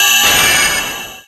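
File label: Battle Boss skill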